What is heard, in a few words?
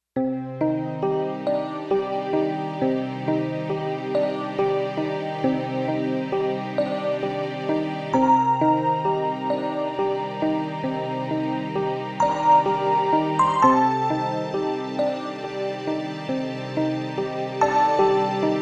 Fender Violin; Digital Synthesizer